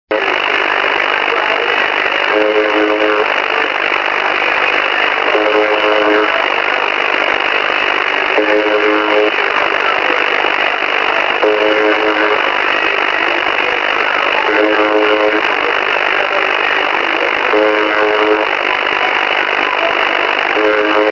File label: uvb-76 buzzer background noise